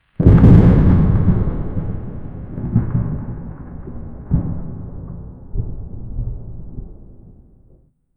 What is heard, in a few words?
realistic lightn, clap